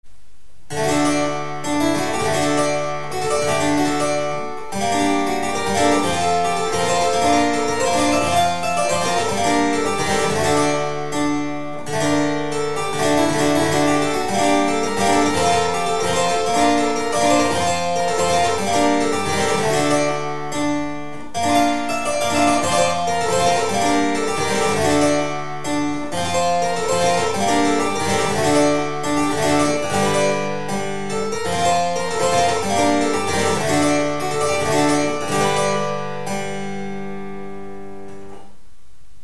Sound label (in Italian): cembalino